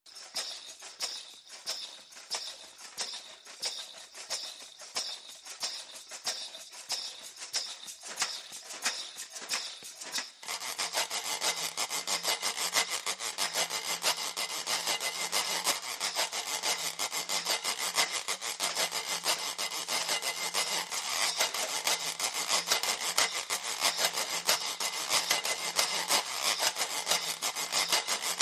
Swampy Groove, Small Perc Only

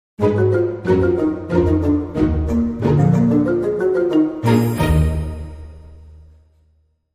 Dramedy Sting

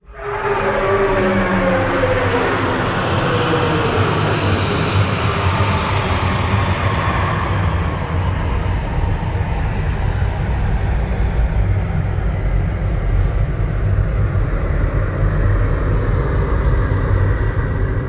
Fly By Slow Climb

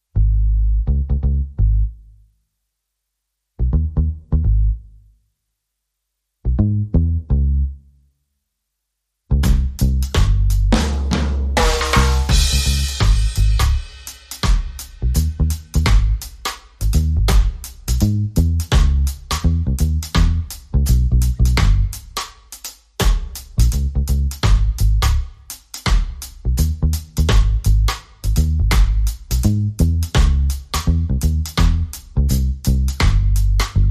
Drums and Bass

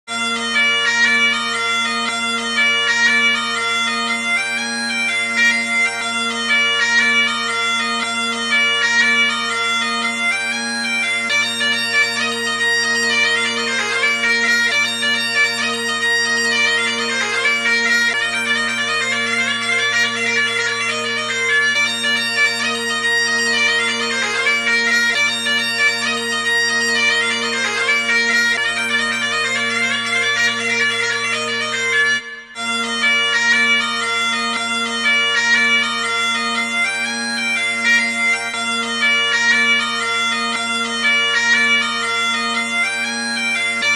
Highland pipe

